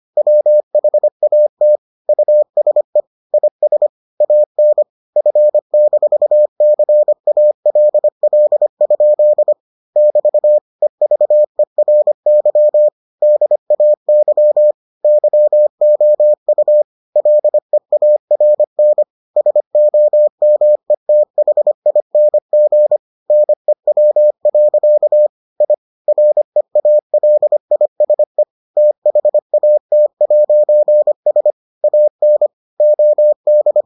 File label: morse